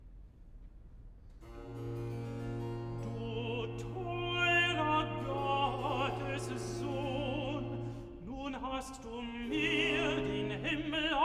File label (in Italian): Recitativo (Tenor); Cantata, BWV 151 'Süßer Trost, mein Jesus kömmt'; IV. Recitativo (Tenor) 'Du teurer Gottessohn'; Bach; New York; 3rd Day of Xmas